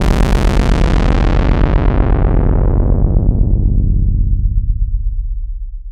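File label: 4 Pole Filter sweep; Disco DSP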